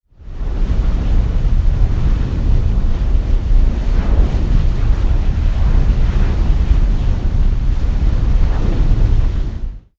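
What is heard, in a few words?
recorded jet noise mic; flyover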